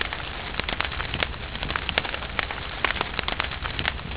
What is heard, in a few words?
sky fire